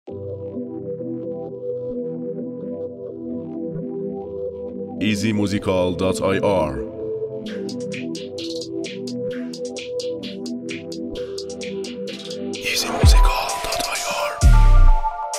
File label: Beat